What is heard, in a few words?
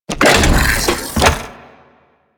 Unicycle Door Open; Warframe; Venus